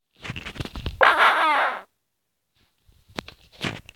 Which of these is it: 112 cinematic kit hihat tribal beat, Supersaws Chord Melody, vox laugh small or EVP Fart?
EVP Fart